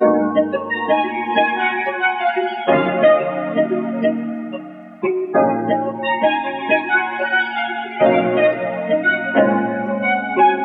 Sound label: R&B Melody Loop - 90 BPM D# Min; Cymatics; Oracle Sample Pack